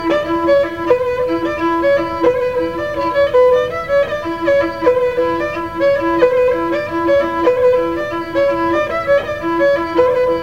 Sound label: Pandalash line#5, Gadulka; Lesson Recordings; Nikolay Kolev - Gadulka Lessons